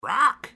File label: Rawk, parrot